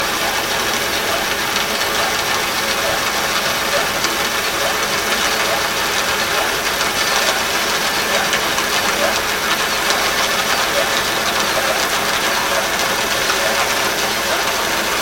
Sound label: Hydrophones, orcasound